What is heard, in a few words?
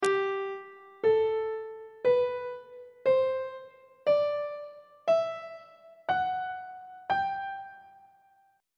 a double flat major scale on treble clef